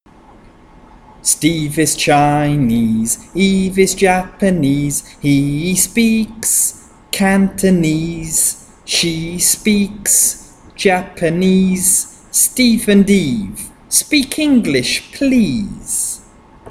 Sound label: Medial Long Vowels Chant e_e